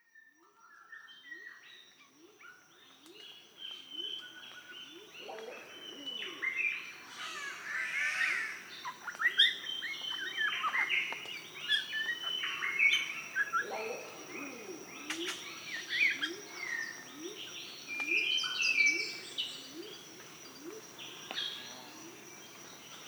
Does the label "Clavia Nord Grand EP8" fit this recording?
No